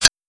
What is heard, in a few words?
Click; Hex